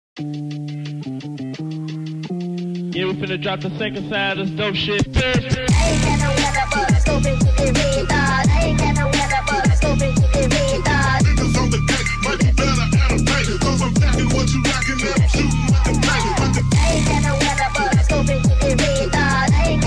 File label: Tape Cassette Cuts With Vocal